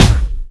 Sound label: MISC PERC; Hip Hop; E-MU Producer Series Vol. 8 – Platinum Phatt